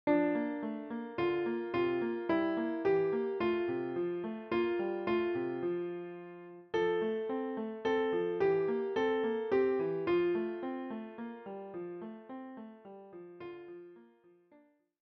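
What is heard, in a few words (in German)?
Leise rieselt der Schnee Klavier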